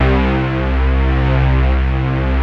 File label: PAD, Vintage Voltage